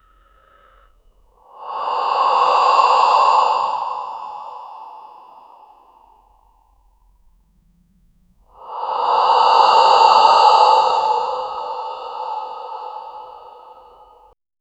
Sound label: a slow rasping breath; anxiety